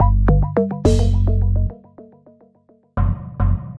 Jingle Fail; Super Rub 'a' Dub; PS3

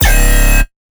Bass Shot 200 C#; Jino Bass Shot Flaming1 200 C#; Exciplex Sample Presets Pack; JinoBeats

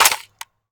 Sfx Jammed Weapone; Akxolotl

